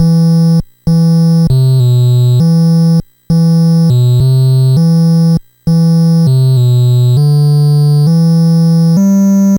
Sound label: NES Bass, chiptune